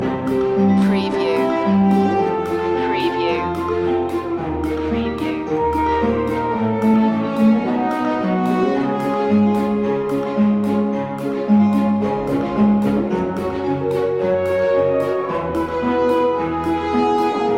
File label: country life loop